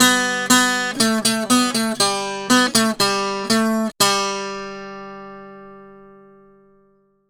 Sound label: Tenor Guitar, Appalachian; Sonic Foundry (Sony Creative Software); Continental Drift Aftershocks